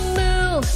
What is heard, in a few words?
moo